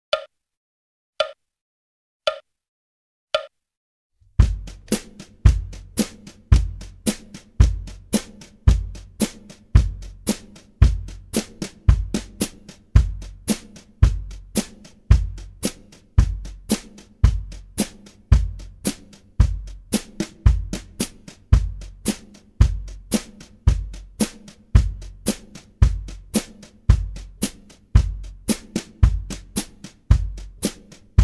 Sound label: Country Rhythm 56 bpm, Guitar; Guitar Aerobics Disc 2